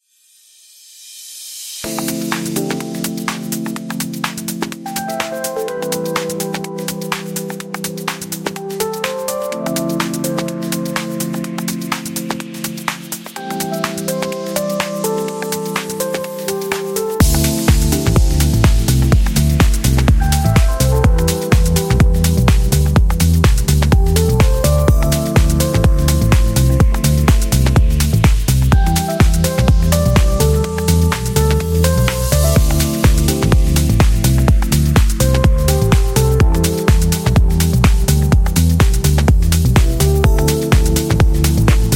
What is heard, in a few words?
Lounge Chill House